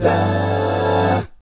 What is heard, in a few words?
GUY CHORDS; Spectrasonics; Vocal Planet; Jazz FX